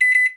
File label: Double Beep, Alarm Clock; Cooking; Turkey Cooking Simulator